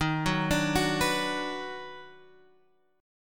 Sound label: Eb Minor Major 7th Sharp 5th, down, Guitar, Half Step Down; chord